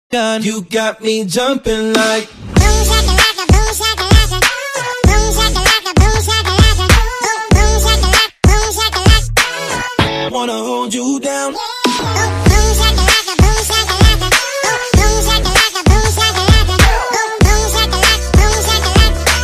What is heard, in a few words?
Ringtone For Mobile